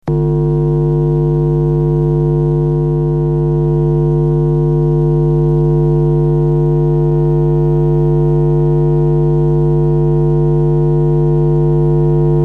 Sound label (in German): netzbrummen